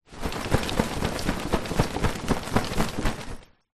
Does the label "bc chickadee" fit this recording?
No